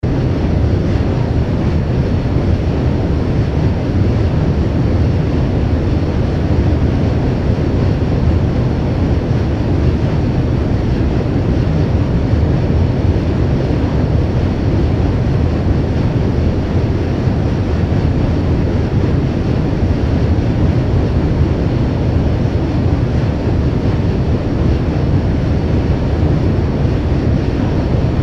jet interieur noise atmo

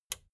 plastic button small latch push

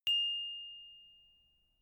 notification glockenspiel single high

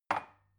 glass put down cupboard

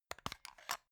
plastic remote battery compartment open

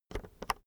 keyboard external enter press slow soft

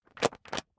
magazine skim bright

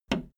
video tape player plastic fast forward push

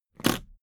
suitcase latch lock jump open hard

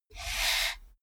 computer mouse draw

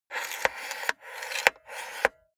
cassette player antenna extend medium

